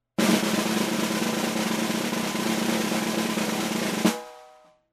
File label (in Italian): Rullo Forte